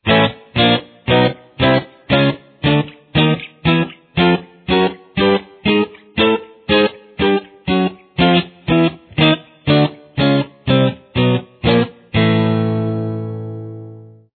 A major barred exercise, guitar

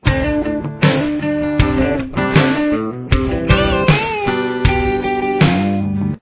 run

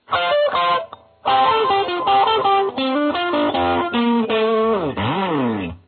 solo

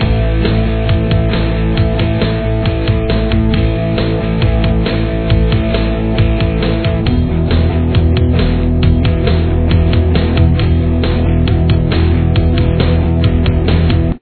solo backing, guitar

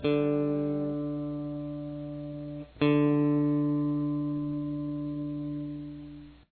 string d, guitar

